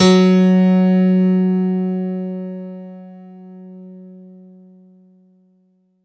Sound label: F#2 DANCE, ANALOG SEQ; Roland JV 2080